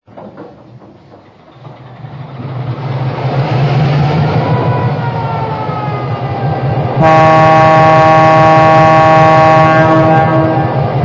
EMD F3; DRG

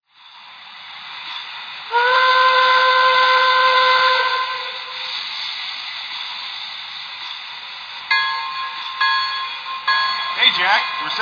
shay, trains

